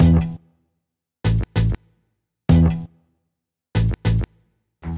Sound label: Guit; Ableton; Breakbeats by KutMasta Kurt